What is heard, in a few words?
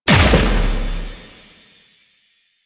Fusion Pillar Pulse; Warframe; Warframes; Qorvex